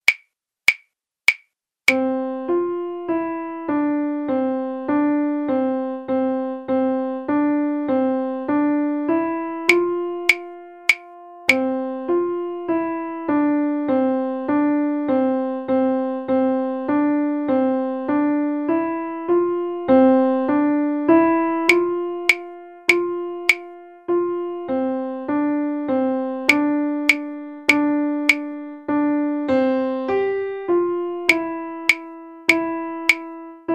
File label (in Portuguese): O PASTORZINHO (Melodia)